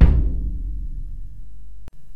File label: bassdrum acoustic; lmms; drums